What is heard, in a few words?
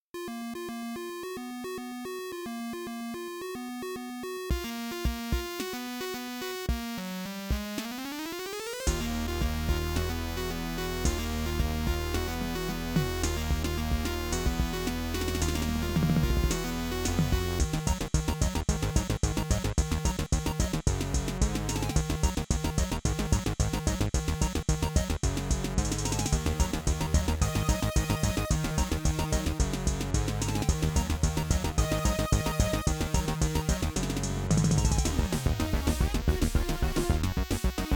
8bit